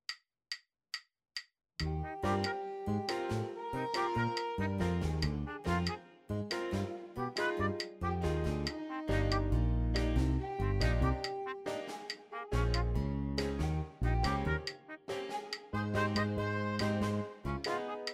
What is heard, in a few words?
group arrangement on Basslicks